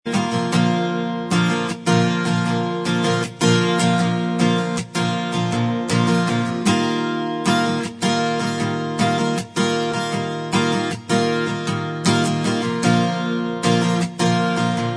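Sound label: acoustic instrumental track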